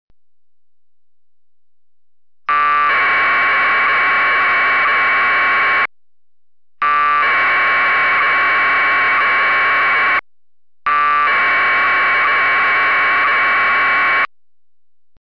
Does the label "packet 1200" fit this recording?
Yes